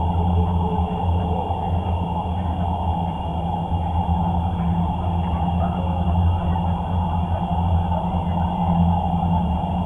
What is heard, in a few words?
amb Swamp; Myst III Exile